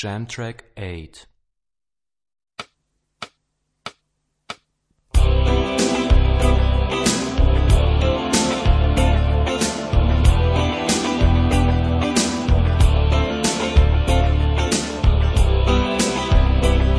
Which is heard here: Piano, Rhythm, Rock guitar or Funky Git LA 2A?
Rock guitar